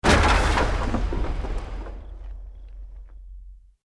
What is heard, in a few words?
EXPLOSION MEDIUM WOOD